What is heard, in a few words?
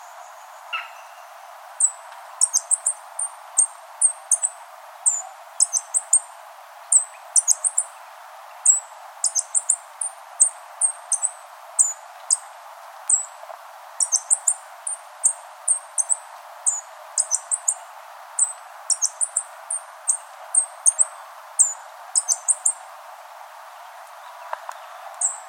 Vervain Hummingbird; Marshalls Pen